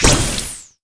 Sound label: DEATH HACK